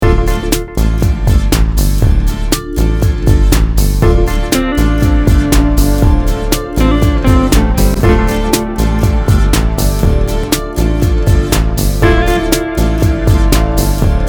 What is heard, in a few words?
CLASSA Tube default